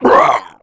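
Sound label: gladiator damage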